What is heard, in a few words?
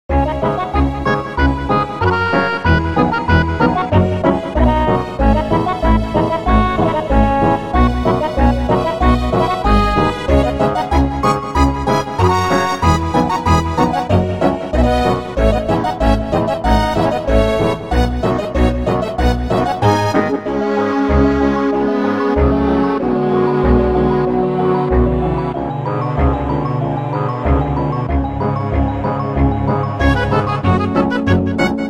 forest maze; SNES; SMRPG; mariorpg